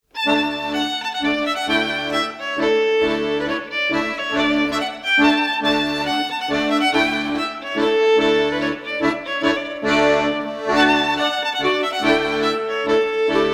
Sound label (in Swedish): polska; från alvros